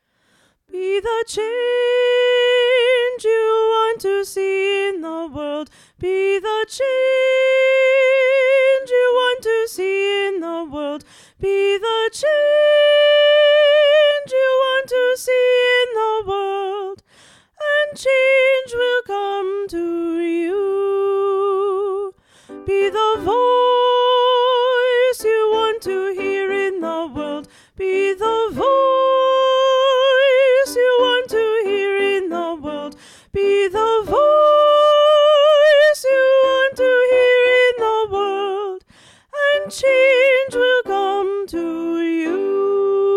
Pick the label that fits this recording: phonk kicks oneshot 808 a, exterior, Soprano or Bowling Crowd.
Soprano